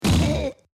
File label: bomb exp